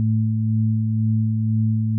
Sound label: hum